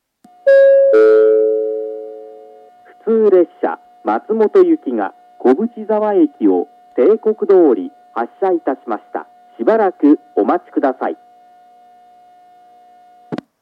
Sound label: shinano sakai 1 bannsenn kobuchizawa hassha